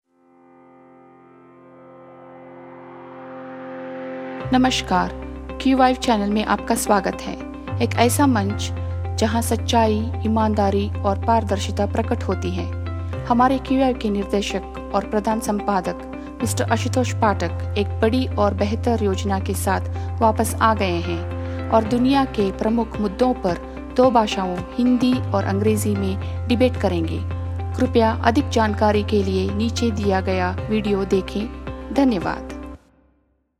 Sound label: hindi pooja